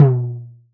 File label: surdo open; AKAI S6000 CD-ROM - Ultimate